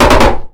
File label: machine gun hit shelter int; Original TK Sounds